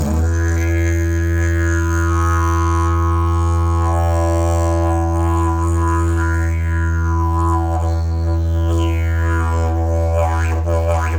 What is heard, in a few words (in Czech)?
javor, didgeridoo